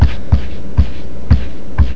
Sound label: load cannon; gunslinger